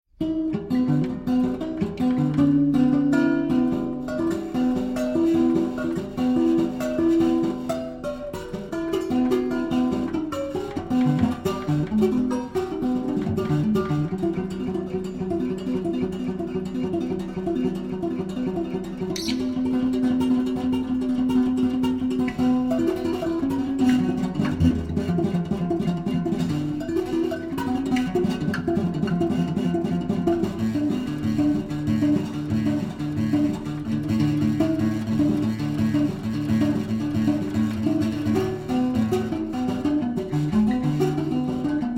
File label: Improvisation; Artistes locaux